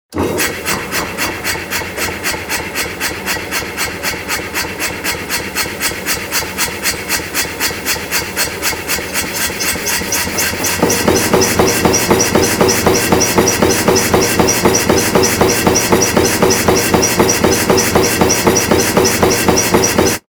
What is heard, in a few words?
machine